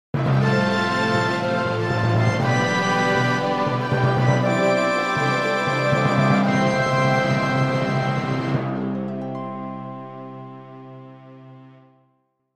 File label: fanfare